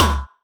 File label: Percussions